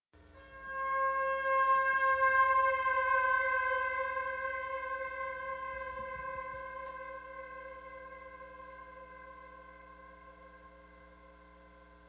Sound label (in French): Jazz trio